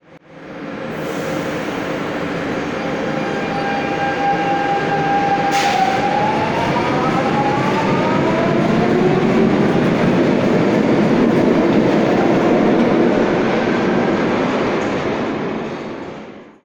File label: train depart out